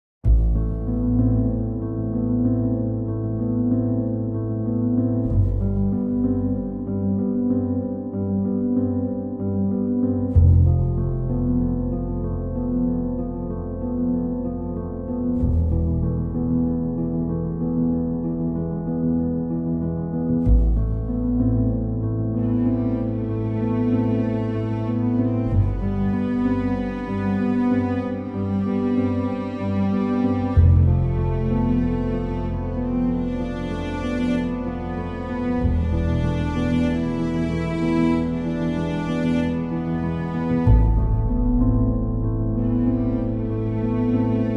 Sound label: Midnight national highway, bgm